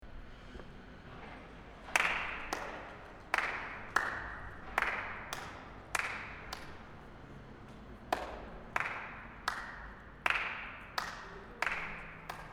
echo sous la voute et ambulance

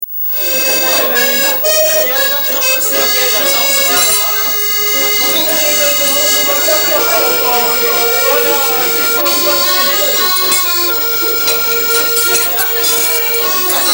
banquet

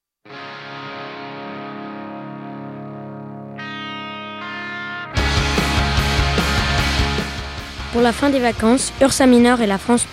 jingle; MJC Tilleul; LFP Ursa

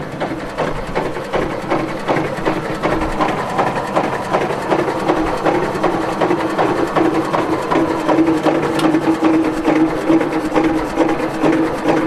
Locotracteur Decauville; MZ-N710; Messeix

